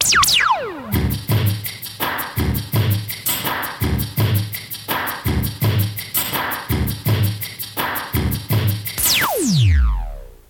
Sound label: Jingle Top 100 filler; Top 100 spelletje-filler; Hitparades